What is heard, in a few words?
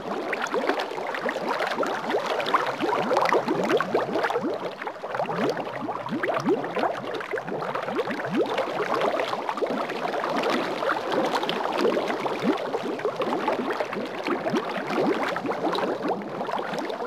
Sharkwing Stationary Bubble Loop, Vehicles; Warframe